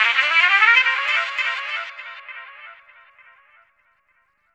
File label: Stab; Thirty-One Flavors